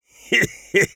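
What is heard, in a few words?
laugh short, French; Engineer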